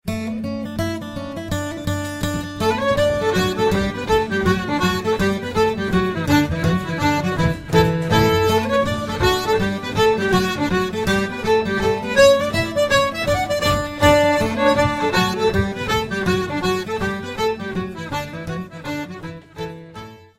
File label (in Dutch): Boerenplof, Pays-Bas